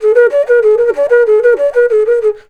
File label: FLUTE D, Indian Traditions